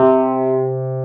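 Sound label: Wurlitzer C3 - L; USB Soundscan vol.09; AKAI; Keyboards Old School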